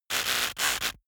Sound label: servo small buzz fluctuate short